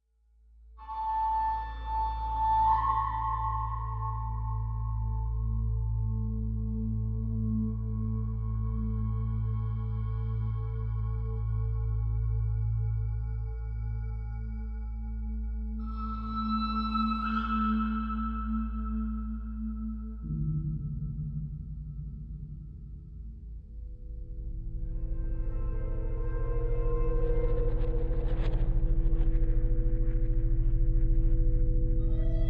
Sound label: forest crash ambient tonal